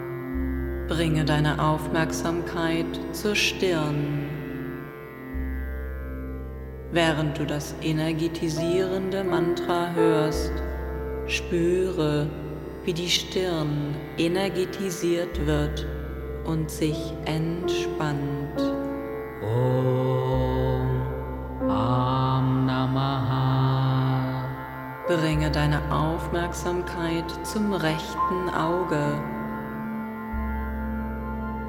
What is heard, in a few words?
Sanskrit Meditation